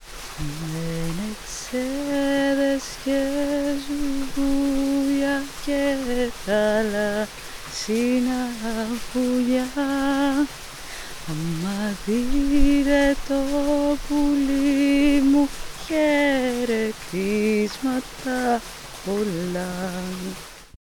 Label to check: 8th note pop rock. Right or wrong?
Wrong